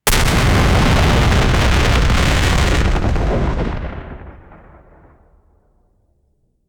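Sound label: heavy sci fi artillery fire, artillery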